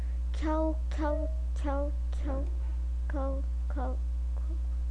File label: cow